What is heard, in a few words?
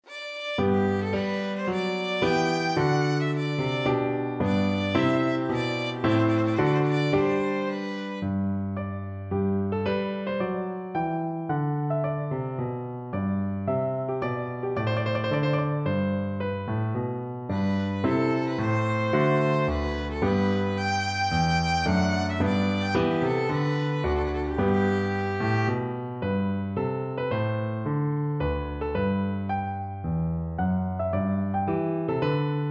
violin